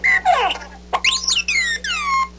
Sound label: bump; r2d2